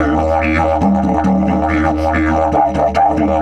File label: EF 140, DIDGE RIFFS